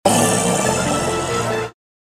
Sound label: aaaaaah